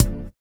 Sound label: VIBRA; Spectrasonics; Metamorphosis